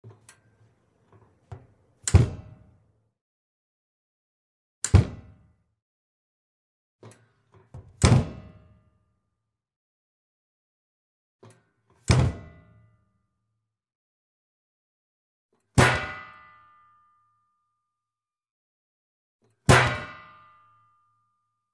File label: Washing machine lid closing; laundry